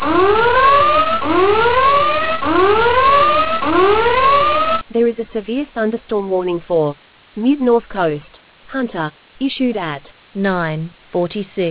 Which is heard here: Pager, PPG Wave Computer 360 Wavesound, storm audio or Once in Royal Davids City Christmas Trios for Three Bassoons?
storm audio